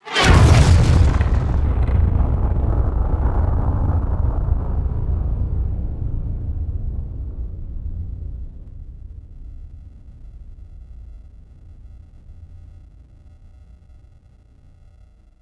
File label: Mega Explosion